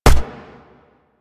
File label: Shot Fire; Warframe; Afuris